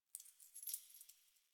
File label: Coin Drop